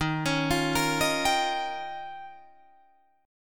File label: Eb 9th, Guitar, Drop D